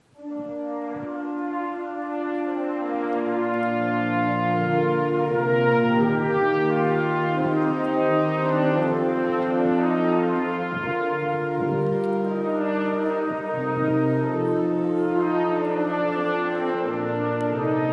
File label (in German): Orgel und Trompete